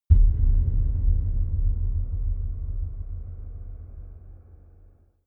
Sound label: dark outer space shadow, hits impacts